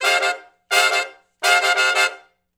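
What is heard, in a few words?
Funk Riff (F) harmony, Horncraft for R&B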